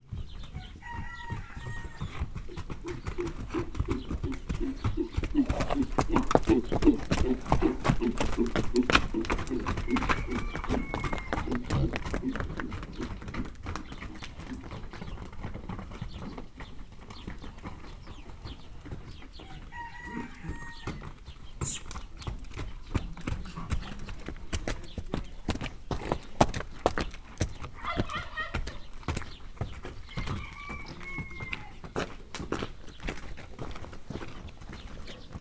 lovasok elügetnek; állathangok; paraszt udvar

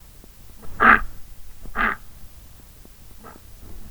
rózsás gödény szélvédő; állathangok; nyíregyházi zoo 2010

